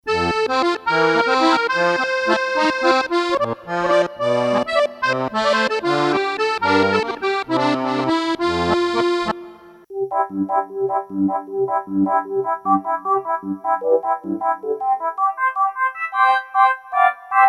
musette pump organ; IK; iRig; SampleTank iOS

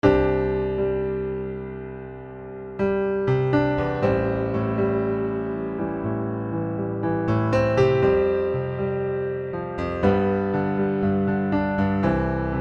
arturia filters PianoV2 TridA

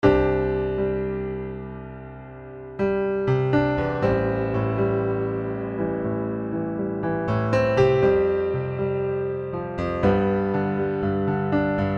filters Piano SEM; arturia; PianoV2

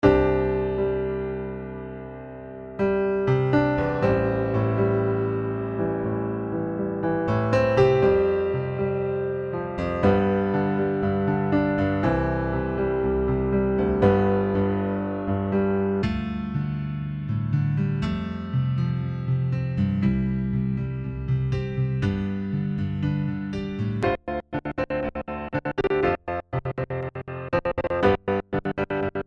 filters Piano M12; arturia; PianoV2

